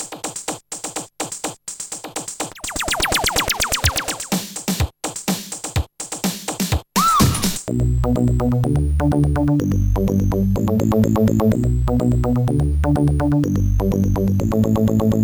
funky melodyz; Trackers; Modland

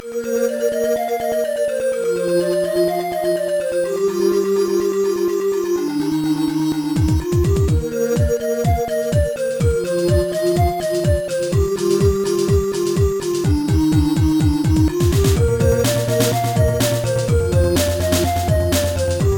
magic flute; Black Dragon

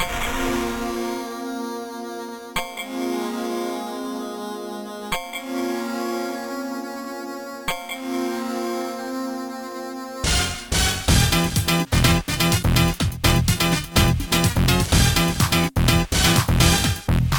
techno style; TG94

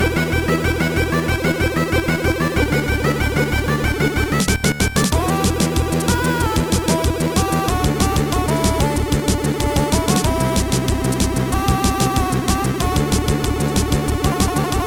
crack tune; Trackers; Modland

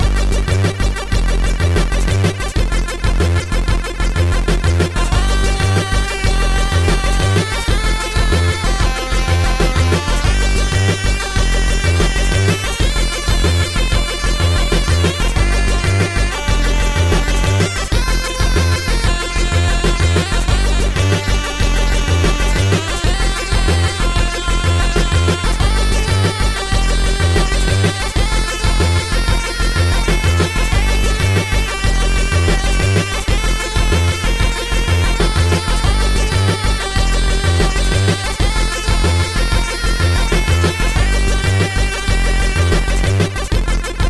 chiptune; Trackers; Modland

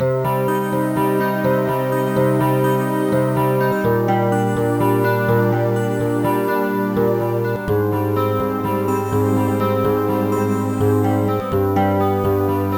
guitar light

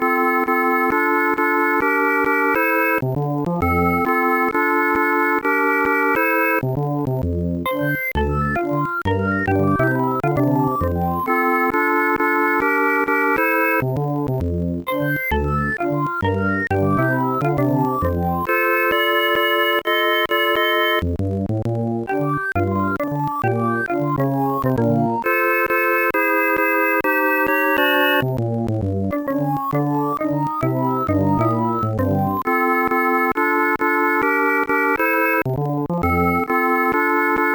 harmony; The Music Studio

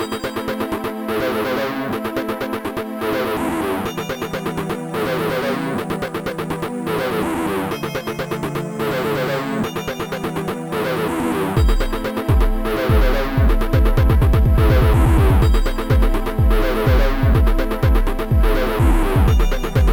love synth; MED

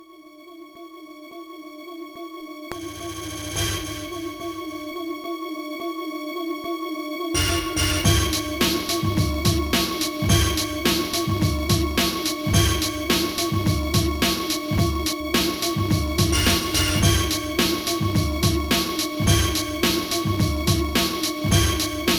hip hop beat; Trackers; Modland

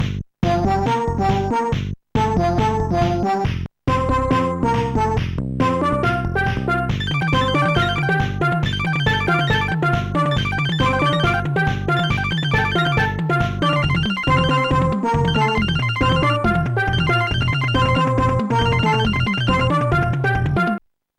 synth head; Instant Music

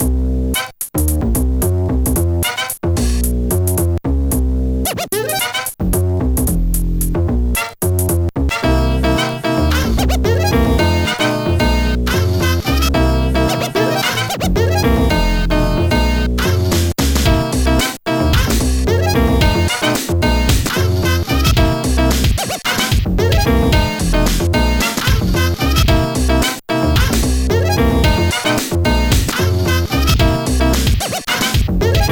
jazz; MED; zyconix

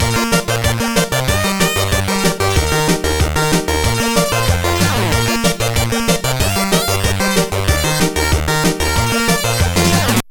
burble gurgle

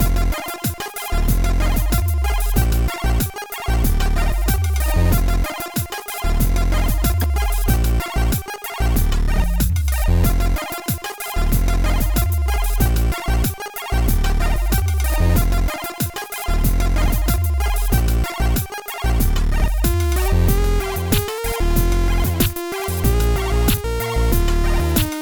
bomb da bass

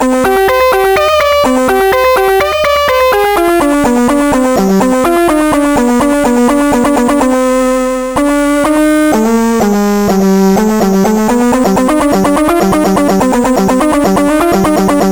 guitar solo; Trackers; Modland

